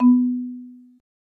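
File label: message received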